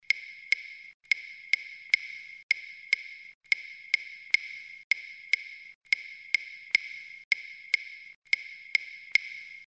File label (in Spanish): clave afro; Esta clave siempre se toca en dirección 3-2